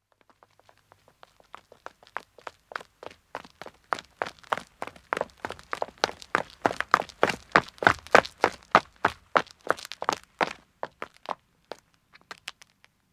Footstep road run, 2 men approach and stop; BBC

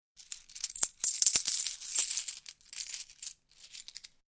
Coin Rattle; Handling coins, shaking coins in hand.; Money Sound Effects; Lynne Music

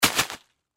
Rock Salt Bag Drop; Drops (Sound Effects:Objects); Blastwave; Foley

